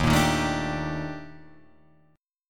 D sharp Major Sharp 11th D sharp Guitar Drop D, chord; 1,1,1,2,3,3; chordshape